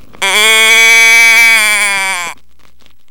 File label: sheep call